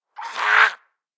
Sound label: spotted, zombie; DayZ Epoch